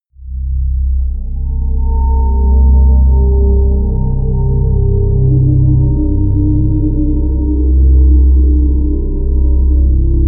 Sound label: drone dark oil